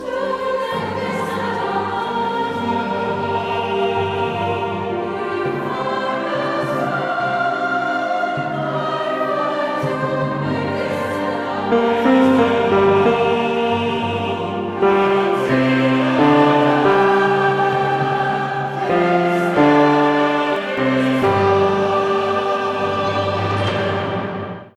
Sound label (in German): bass; franziskuschor